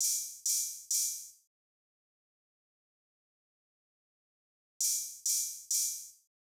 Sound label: Drum Loop, 100 BPM Open Hat, RnB; Loop Stems; Cymatics; GHOST (Beta Pack); Atrophy Drum Loop